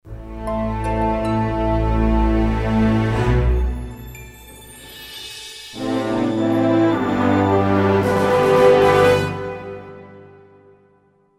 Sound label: Transition; bridgecommander